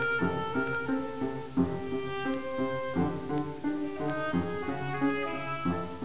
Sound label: oboe